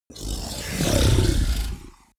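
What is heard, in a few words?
fishman breath grunt, creatures; kreaturen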